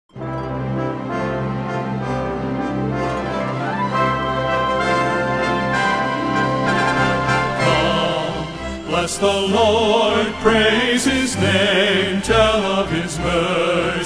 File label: men's chorus